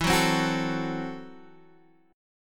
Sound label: C 6th Flat 5th E